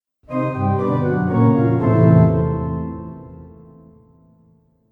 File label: Haunted Organ Failure Sound; Musical